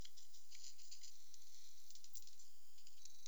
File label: Daubenton unfiltered, bat